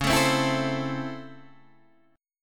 C sharp Minor Major 7th Sharp 5th C sharp Guitar Open D